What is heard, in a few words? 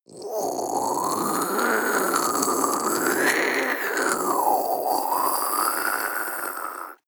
zombie female throat; kreaturen